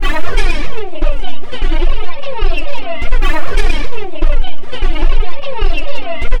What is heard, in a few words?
Loop, Esoterik Beatz; Sonic Foundry (Sony Creative Software); Agrymet